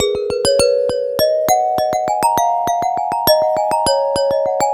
Fast Glass

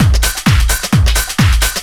drum 130 groove; Techno Trance; DRUMLOOPS; Techno Trance Essentials

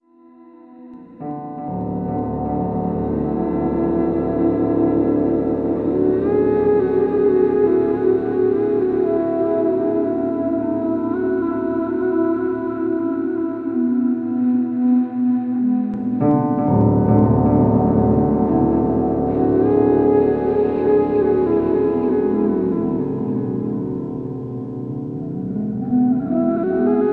Dirty Flute Piano; Sonic Foundry (Sony Creative Software); Slow Silhouette

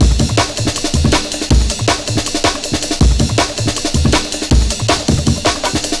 160, Drum Loops; Total Drum&Bass

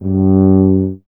Tuba, Solo Instruments; Miroslav Vitous

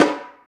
Repinique Soft, Percussion; Roland BK-5

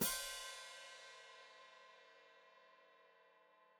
Hat Closed Open, Hi-Hat

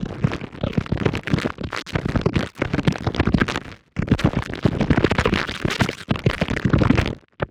asmr

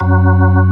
full fast, ToneWheel Organ; E-MU Formula 4000 Series Vol. 3; Analog Odyssey

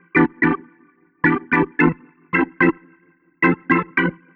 Jazz Keys Organ 110 EF, Chordy Loops, 110bpm

